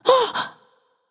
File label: surprised, voicepack; smite; jorm